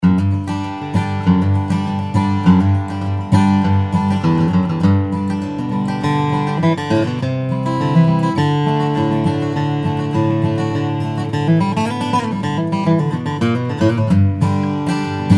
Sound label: nickerson d55, steel string guitars flattop; dreadnought